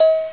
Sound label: celeste; Sound Effects for Multimedia - Volume 1